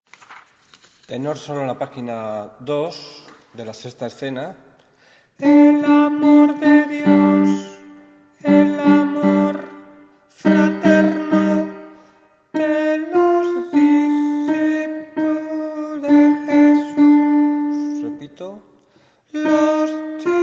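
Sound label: tenor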